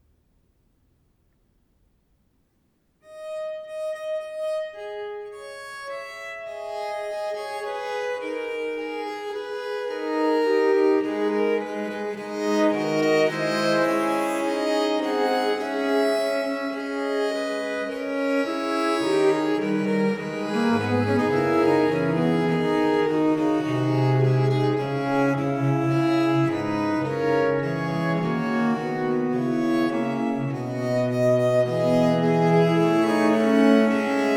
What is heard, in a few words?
fantasia in a minor, viols